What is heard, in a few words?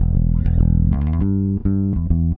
Electric Bass; Basses